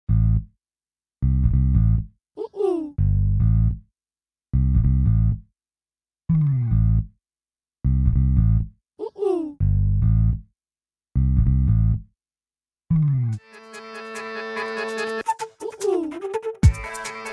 Instrumental; shuddh desi romance 2013

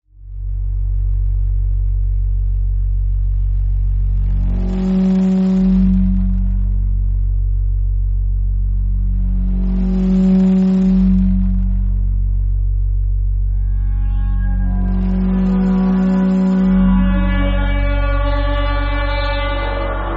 Train Crash Memory Instrumental; the railway men 2023

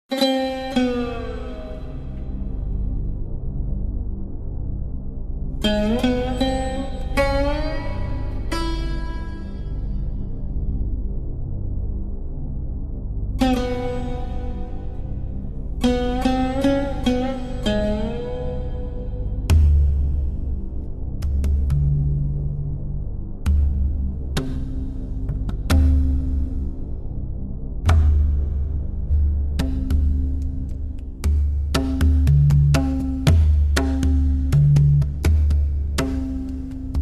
Twilight Instrumental; dharm 2007

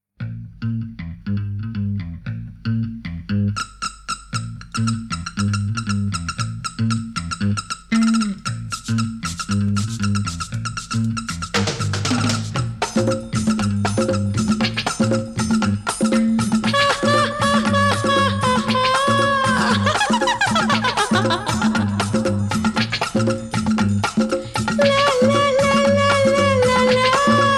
Dance Music; Maut Ka Saya

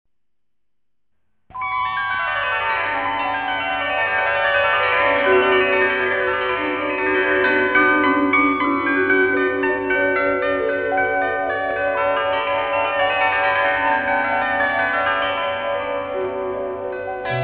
Theme Instrumental; Aandhi

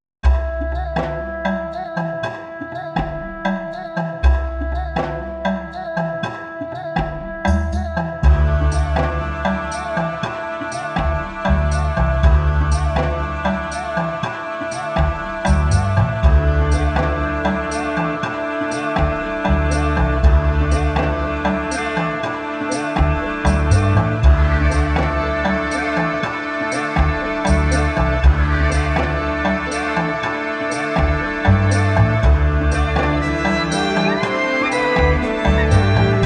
Background Theme Music

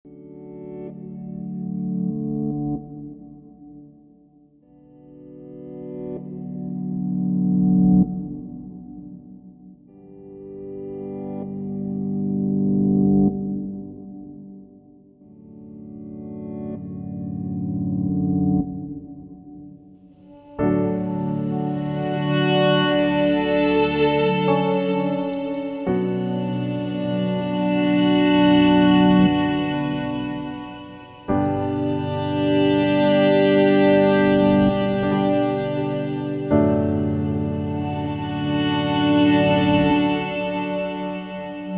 Theme Instrumental

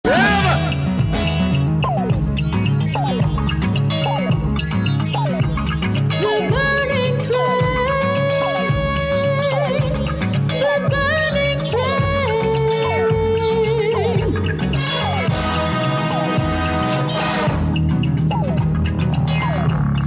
Train Theme Instrumental

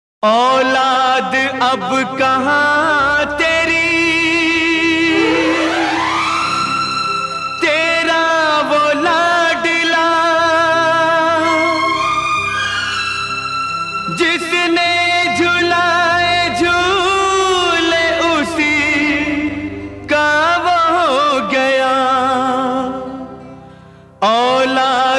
Sad Version; aulad 1987

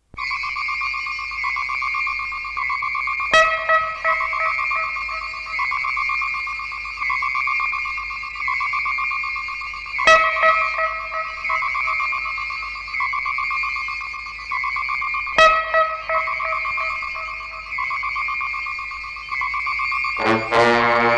Theme Music; Jadu Tona

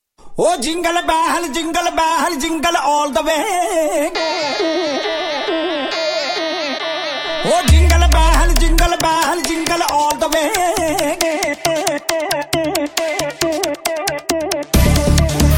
Jingle Bells Bell Mix; hide seek 2010

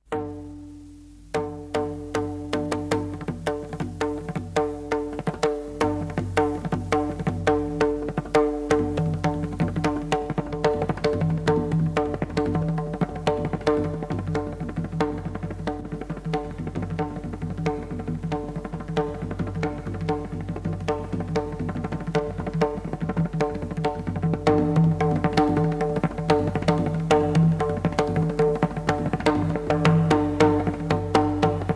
The Rhythm Of Pakhawaj; ek nai paheli 1984

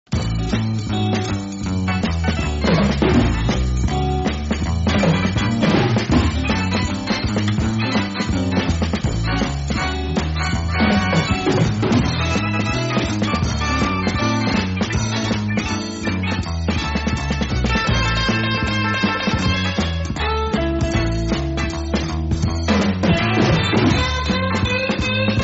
Background Score; Vijeta

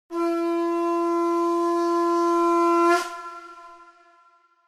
Instrumental; dharm 2007

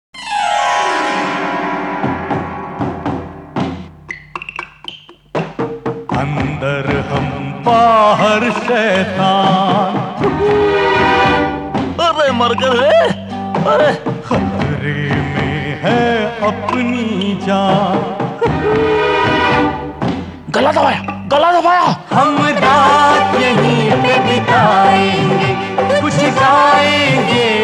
Sad Version; roohani taaqat 1991

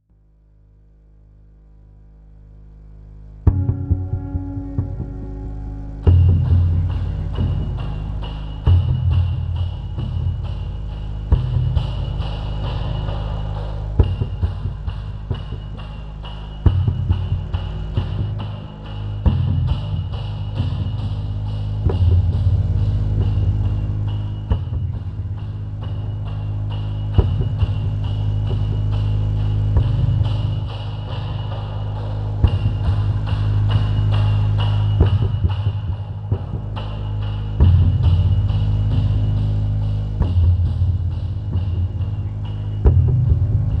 Instrumental; the railway men 2023

